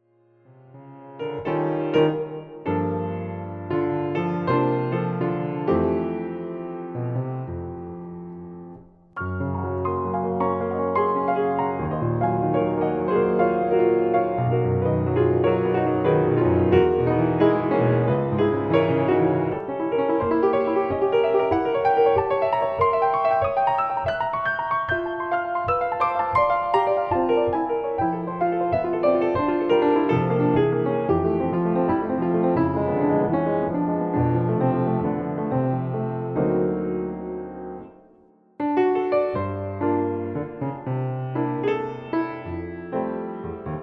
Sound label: Jazz in Concert